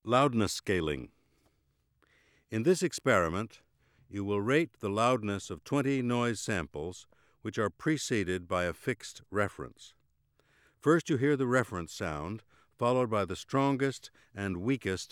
Loudness scaling

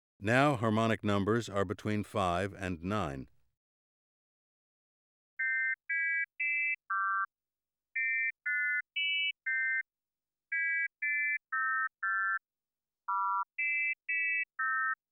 virtual pitch with random harmonics

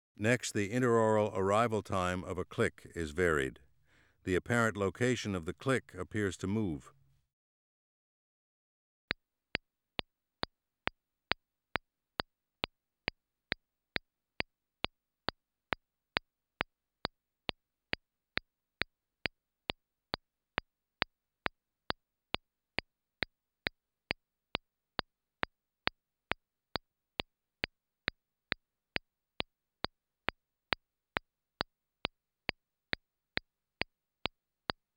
binaural lateralization

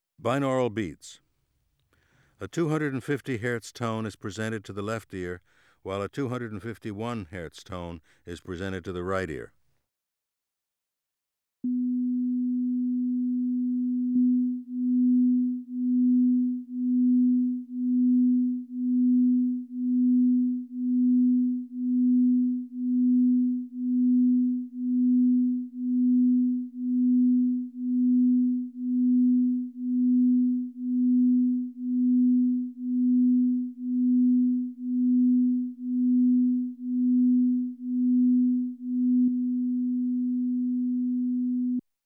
binaural beats

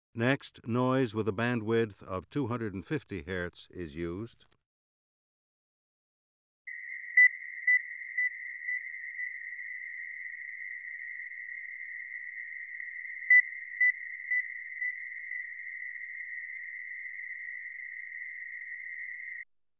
Critical bands by masking

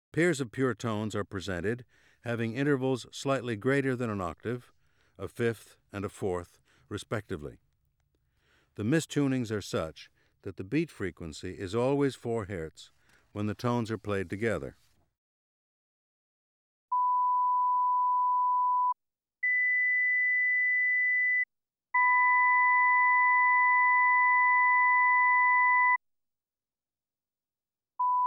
Primary and secondary beats

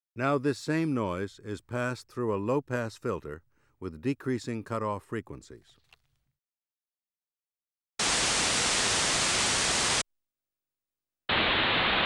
Filtered noise

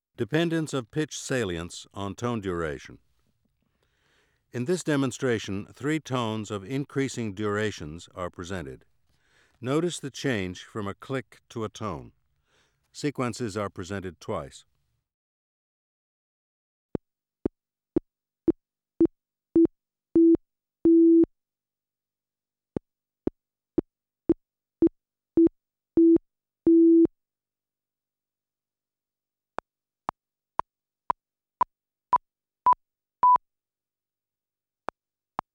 Pitch salience and tone duration

